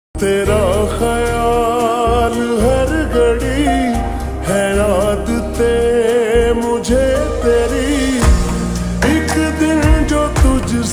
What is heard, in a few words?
Ringtone slowed and reverb